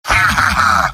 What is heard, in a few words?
laugh evil; heavy; video games; source engine; tf; mvm